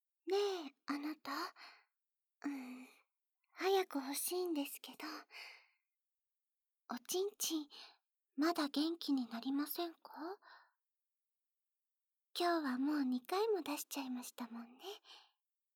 耳舐めと逆耳舐め; 元奴隷少女ロザリィ～いつまでもあなただけを愛し続けます♪～; 効果音なし

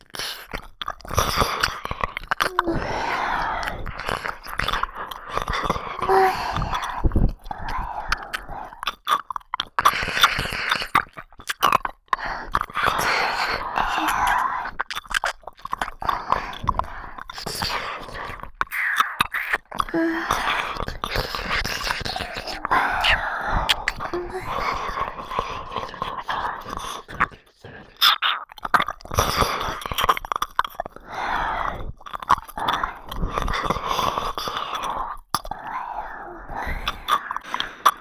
両耳なめ 奥まで

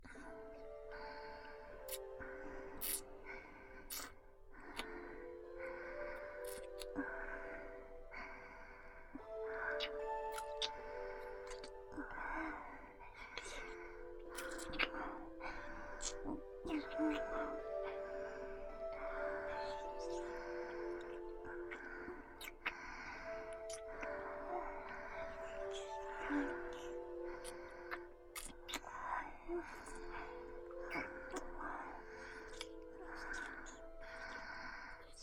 胸舐めボイス 吐息入り

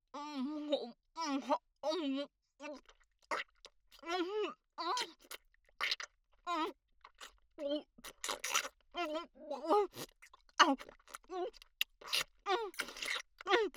フェラ・激しくフェラをする; お色気お姉さん系カノジョ

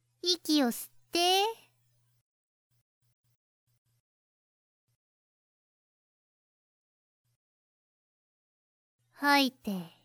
息の吸い吐きロング; リュエルさん

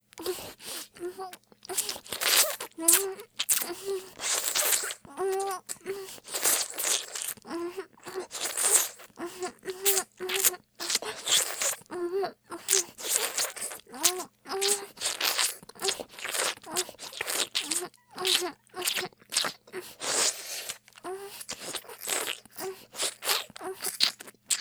ボーイッシュ系音声素材, 激しめにフェラ; Honey Drop

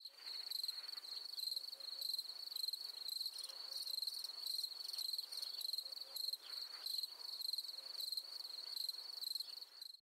鈴虫の声

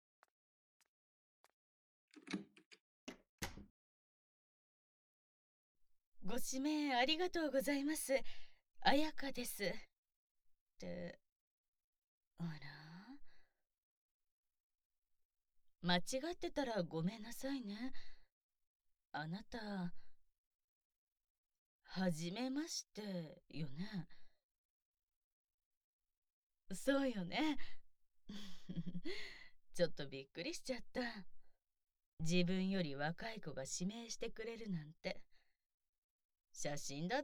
ゲップ

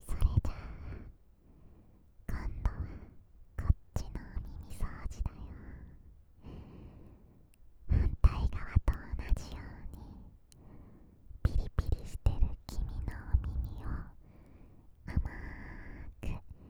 お姉ちゃんの甘い吐息（左耳）; 心拍音off

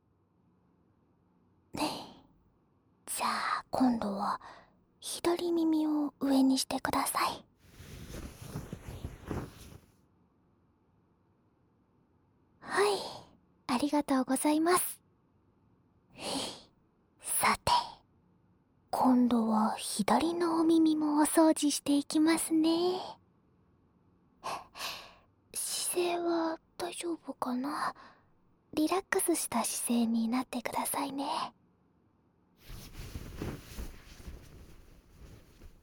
膝枕でお耳吹き＆耳かき（左耳）; いやでんっ!2 ～癒やしの寝台列車「コンチネンタル特急」～; 本渡楓; 列車音

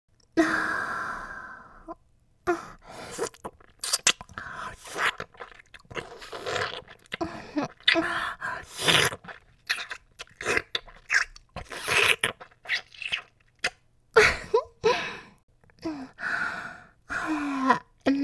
おまけ音声（ちゅぱ音特化）; 桃色ハイエルフと乳白色ミルク交換合戦; ＳＥなし

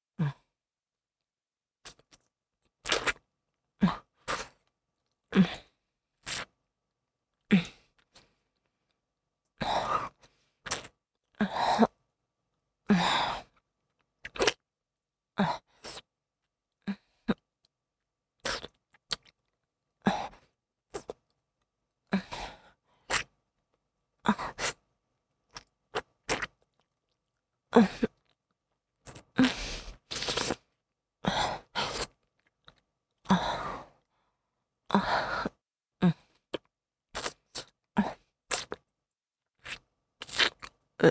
フェラチオ(弱):包皮の被った亀頭・陰茎を舐める, チュパ音

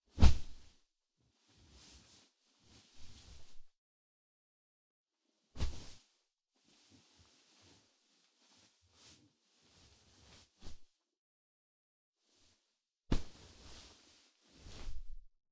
布団 に ダイブ する; 3DIO; 布団の音

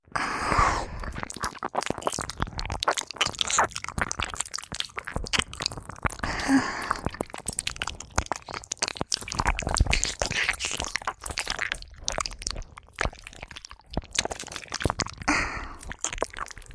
耳舐め 手コキ のみ 左耳用

